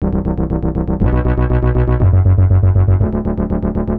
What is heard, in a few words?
Bass 120-C, horrorscape samples 120bpm